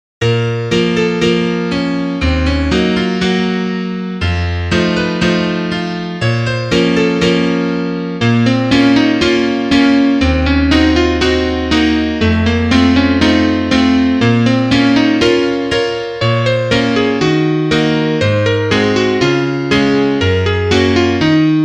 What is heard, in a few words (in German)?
Klavier Solo, trompete; Artikulation